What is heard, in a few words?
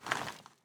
aim out, weapons; STALKER; IWP